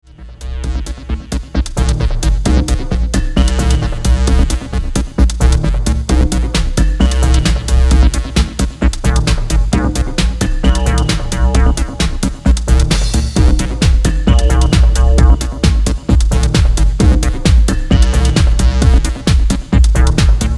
Tribal

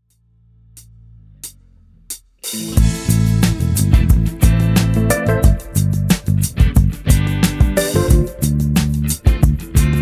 Rhodes Version

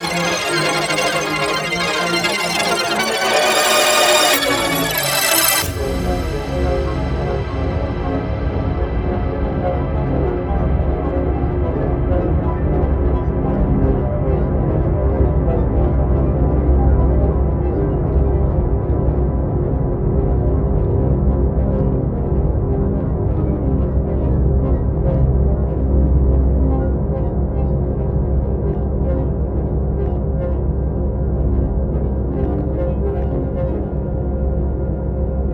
Surreal Ambient Recorded Parts

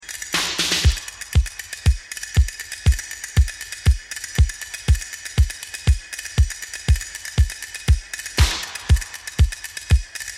Instrumental Version

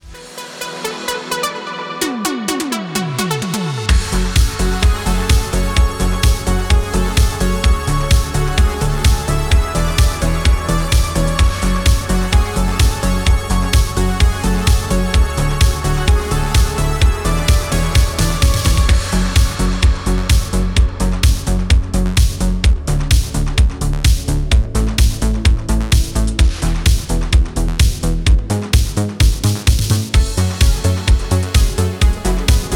Remix Instrumental

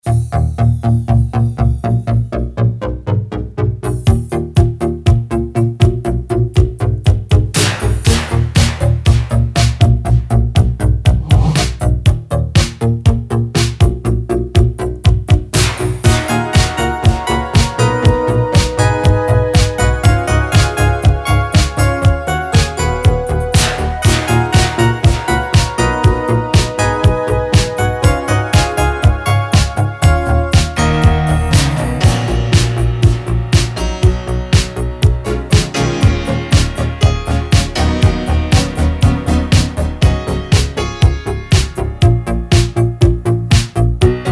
Instrumental Version

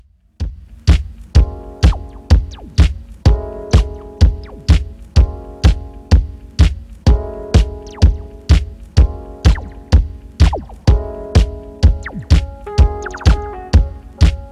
Piano Jam Mix

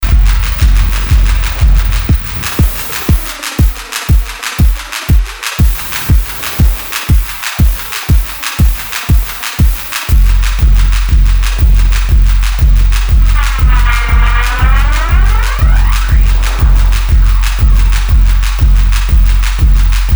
War Drum Dub; Novel Sound

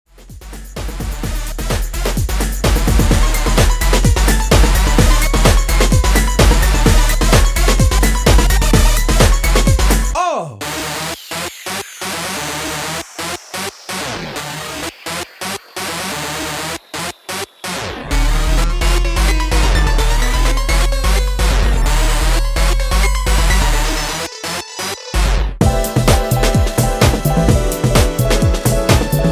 8bit Bash

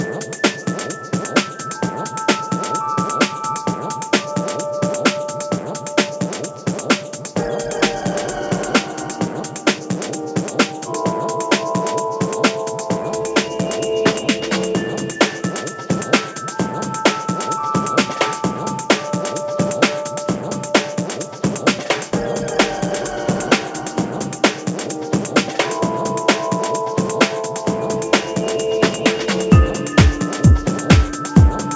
Underwater

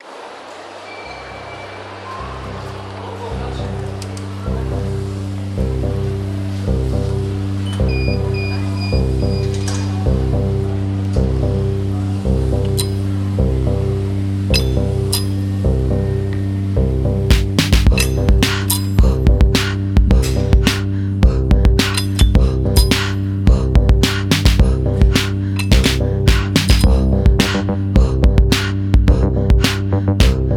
Arpeggio

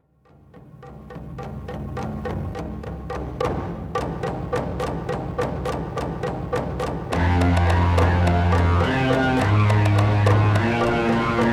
Techno

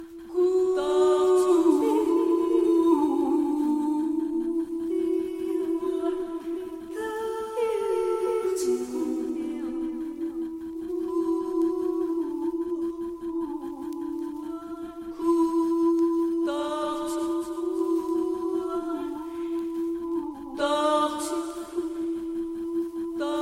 Solo Voice

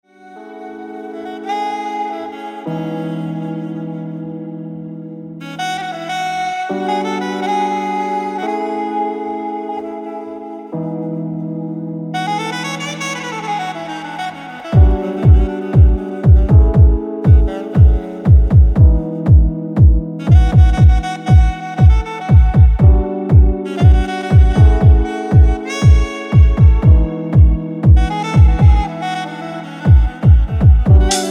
Life Sax

